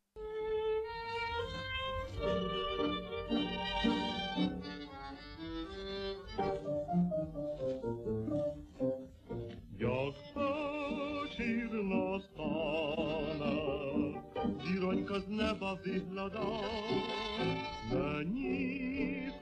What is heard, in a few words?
Ukrainian tango